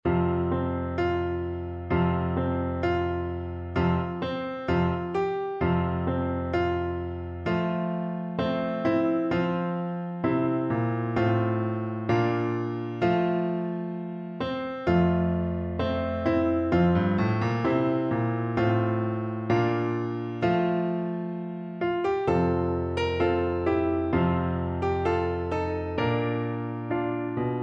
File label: grenadiers, XYL; Percussion